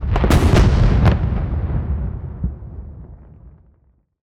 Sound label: firework